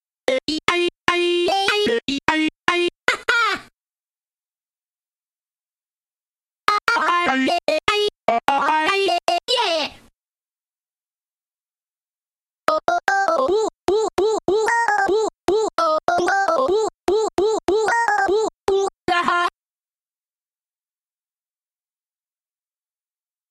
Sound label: Voices spooky; fridaynightfunkin